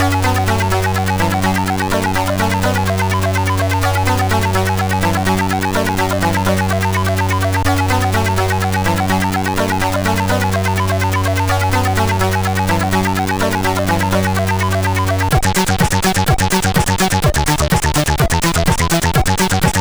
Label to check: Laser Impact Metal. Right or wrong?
Wrong